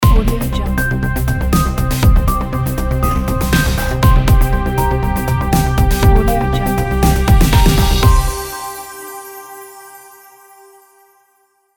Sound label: news opener intro logo